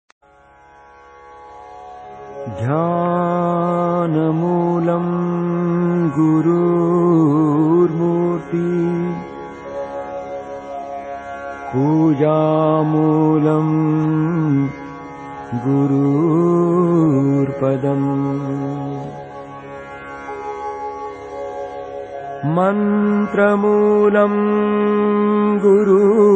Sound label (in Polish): mantry swiata wastu; WASTU – Hindu